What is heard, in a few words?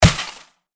Bullet Impact Television; Warfare; Impacts (Sound Effects:Combat)